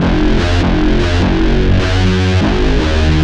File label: Bass, Synth Bass Loops